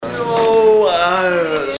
Nooo-aaaiiieee!; Trent Reznor

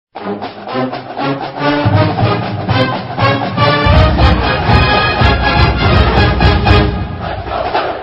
marching band lets go blue with yelling; michigan

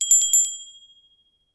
quick jingle bell; salamisound

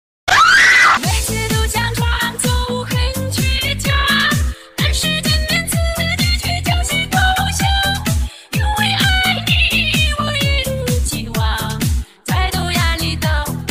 owooooooo

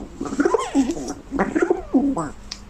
pigeon sound

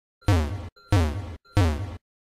collision sound effect; pokemon red blue yellow

